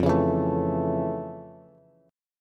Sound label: F Augmented Major 7th F Bass